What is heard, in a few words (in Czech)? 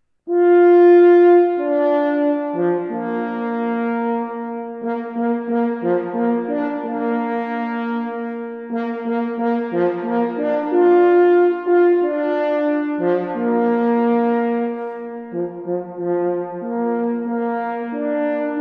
Slavnostni fanfara